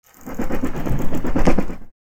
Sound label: Bat, Fruit Wing Flaps. Low Pitched Flaps Of Bat Wings. Medium Perspective.